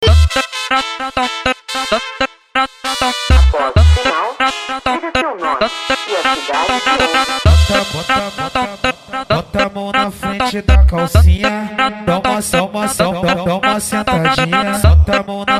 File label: funk; coruja som car e borracharia do coruja